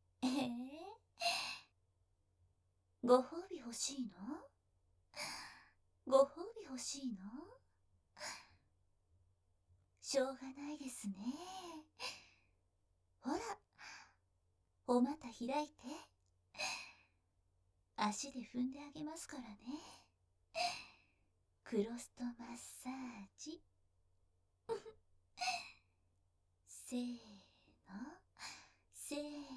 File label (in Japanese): ご褒美の足踏みマッサージ, ASMR